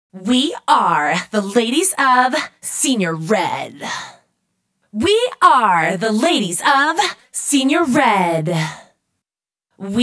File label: we are the ladies processed vocals